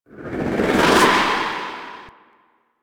Queens Chamber Queen Blast Charge; Ambience; Warframe; The War Within

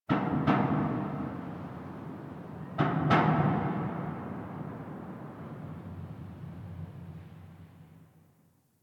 Ambient Metal Bang; Warframe; NineteenNinetyNine

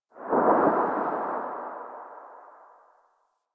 Operator Wraith Void Move; Warframe; Focus; Powers; Wraith Movement

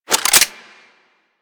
Pistol Reload, Weapons; Harlequin

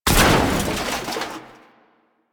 Internal Ship Damage; Warframe; DE; Vehicles; RailJack; RailJackHitEffects; Railjack Hull Hit Effects Internal

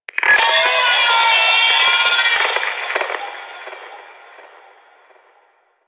Vomvalyst Lure Song; Warframe; Teralyst

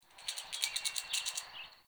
Forest Open Glade Chipmunk, Day; Ambience

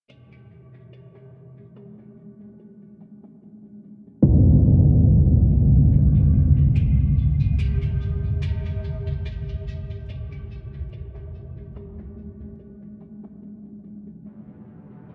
Hub Cue, Music; Warframe; DE; Hubs; Zariman

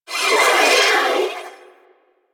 Sentient Symbilyst Death; Enemies; Barks

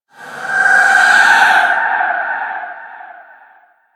Rell Specter Vocal Death; Enemies; Warframe; Priest Quest

